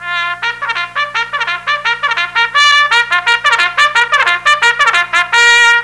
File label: BUGLE